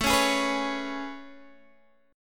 A Major 9th A, Guitar, DADGAD

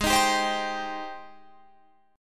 Ab Major 7th Ab down Guitar Open F; AbM7 strummed

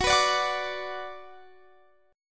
Gb Suspended 4th Sharp 5th Gb

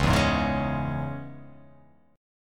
Db Minor; strummed; JGuitar

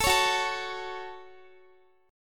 Gb Minor Guitar 4E,4A,5D,5G,5C,6F; strummed

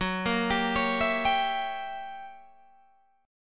F sharp 7th Flat 9th Guitar Open G, chord

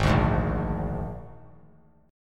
Ab Suspended 2nd Flat 5th Ab, chord; strummed; JGuitar

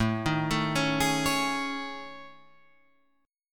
A Major 7th Flat 5th; chord

